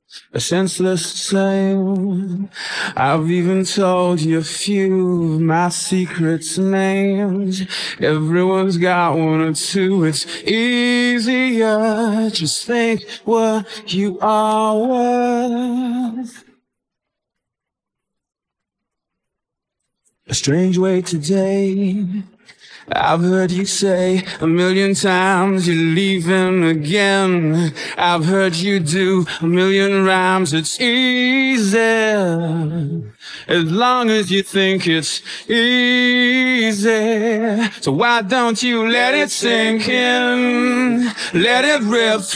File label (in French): chant; demixificateur